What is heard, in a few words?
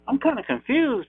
confused